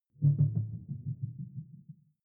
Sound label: sci-fi critical bell deep